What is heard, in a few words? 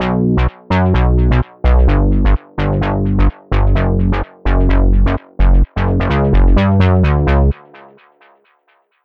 Big Room Prog House